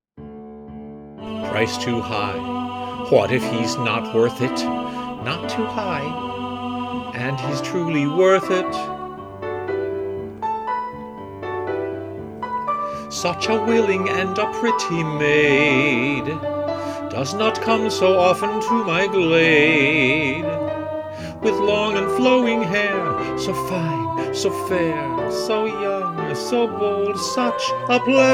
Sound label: Pure Gold VOCAL